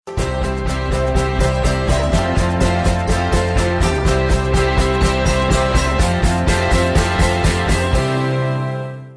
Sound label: Theme; Happy Endings